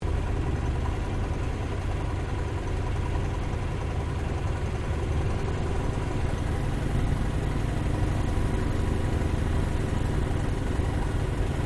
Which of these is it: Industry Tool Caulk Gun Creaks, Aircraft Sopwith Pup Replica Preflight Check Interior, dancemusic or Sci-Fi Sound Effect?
Aircraft Sopwith Pup Replica Preflight Check Interior